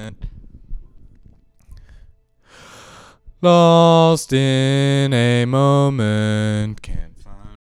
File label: Male Ambient Lead Voc